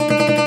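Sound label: OUD tremolo, Plucked Strings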